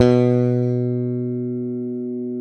SPEC SUSTAIN, Bass

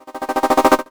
Misc Short FX

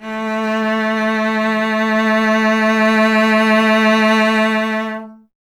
STR cello A, Cello; Miroslav Vitous; Solo Instruments VOL-1

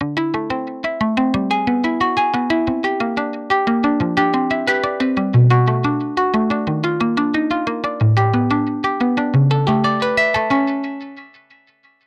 cleaner synth guitar

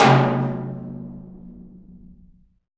PRC AMB, Africa, Hand Drm; Roland LCDP14 Africa VOL-2; Ambient Tar

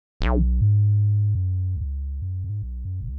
bass, Bbmin; Big Fish Audio; Hardcore Breakbeat Action; Suspicious

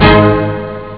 pizza hit; robocop; ST-XX Amiga Soundtracker Sample Packs

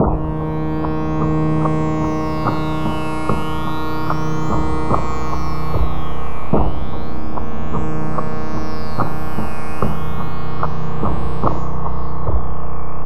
TRANSFORMATION BEAM; Sonic Foundry (Sony Creative Software); Vir Unis Infusion

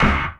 Thwack, Drum & Bass Hits & Bits; Total Drum&Bass; 90 s Sample CDs

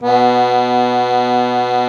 BANDONEON H0; Best Service; Alpine Volksmusik

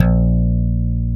Alembic Slap A#1; Mo' Phatt; Digital Sound Factory Mo' Phatt

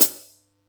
Yamaha EX-5

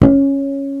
HARMO A2, ELEC BASS; East West - Global Sounds

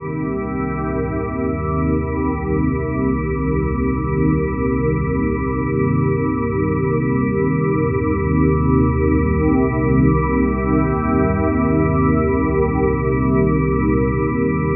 Pad C2, Club Techno; Zero-G

